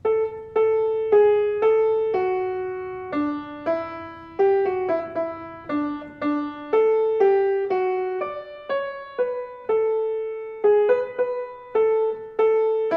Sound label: St Vincent MELODY